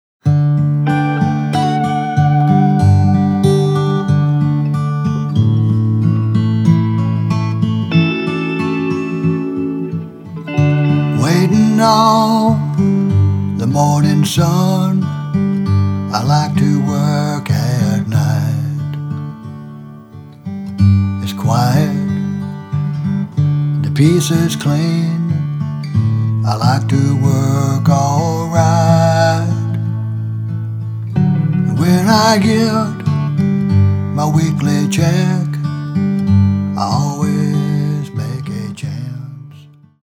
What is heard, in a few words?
American Romance